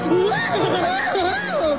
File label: kooky laugh